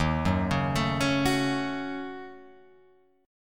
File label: D sharp Minor 7th Flat 5th, Guitar, Open F; D#m7b5 arpeggiated; 3,1,1,4,4,1